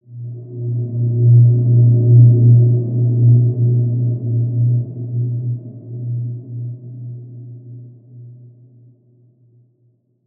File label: Large Space B2 mf, healing soundscapes